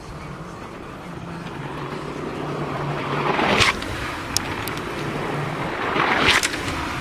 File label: two takeoffs; EAU CLAIRE HTS; THOMAS NAUNAS